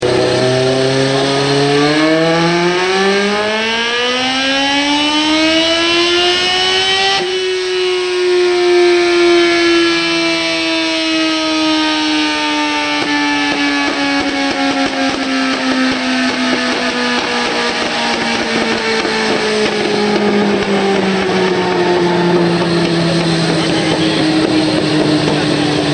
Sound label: ZX9 hindle pipe, motoskanas